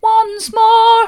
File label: once more mid, vocal